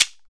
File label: PRC WOLOF, Afro Shakers